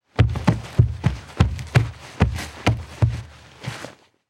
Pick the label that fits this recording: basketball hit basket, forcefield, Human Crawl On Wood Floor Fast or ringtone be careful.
Human Crawl On Wood Floor Fast